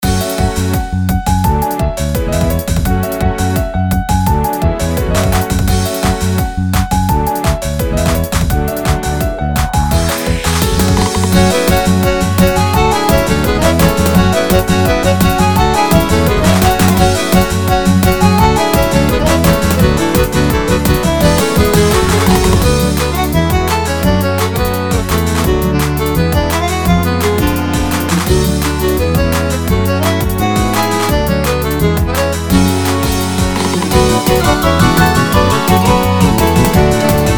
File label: Falconer's Whistle; boss themes arrangements